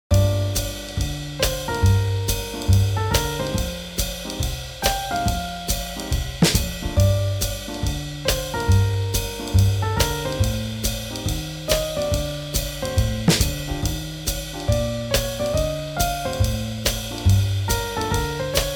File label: Swing Jazz